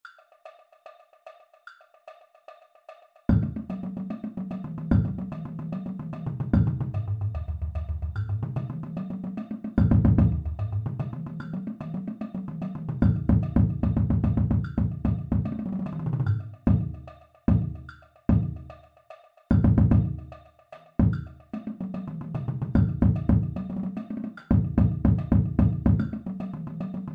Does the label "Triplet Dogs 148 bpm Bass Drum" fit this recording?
Yes